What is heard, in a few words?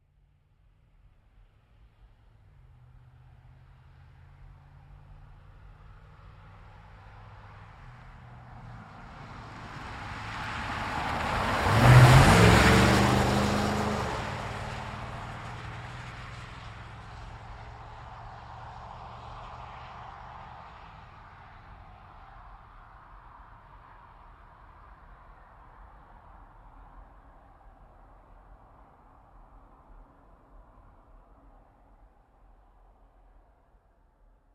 Truck GMC Savana By Corner Fast; 2010 GMC Savana; Trucks; Vehicles; Big Room Sound